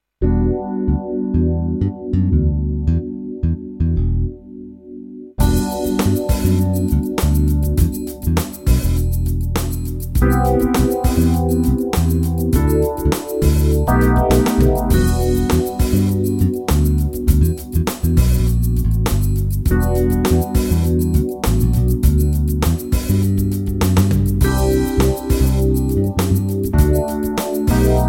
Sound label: E bass Old EP